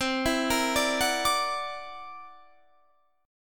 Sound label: C 9th Sharp 11th C